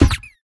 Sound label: Hammer Hard Kick; raw game audio files; Mita; MiSide